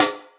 Snares, Real

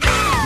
female orgasm

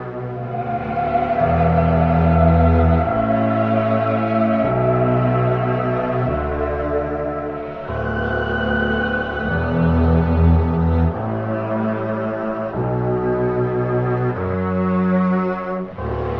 strings piano keys, Sad; Loops

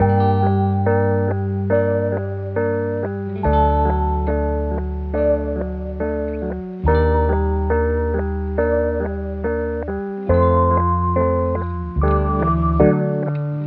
guitar and keys, Loops, Sad

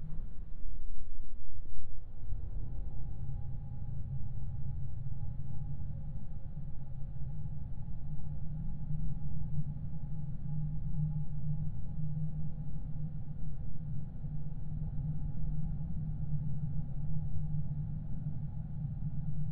low wind; Rumbles

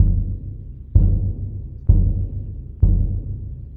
knocking, Drum loops

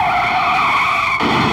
screech crash, Vehicles

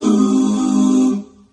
ooh harmony; Notes

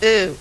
"ooh", voice

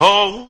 "ho" SUNG

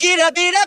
voice gidda gidduh; Phonky

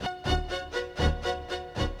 strings percussive, Sad; Loops

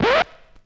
skip, Percussion, Vinyl

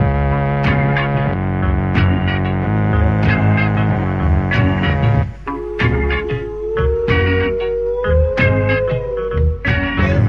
bass, Loops, Phonky

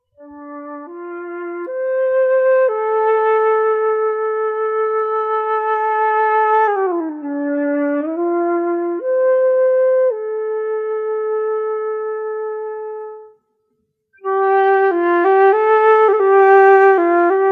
flute stuff

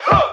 huh group of men

